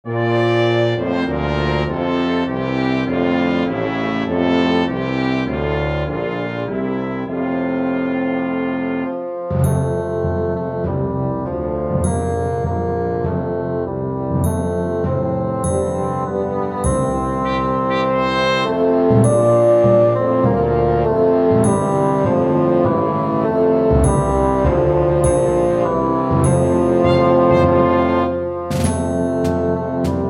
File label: Tenoroon Track with Melody; While Shepherds Watched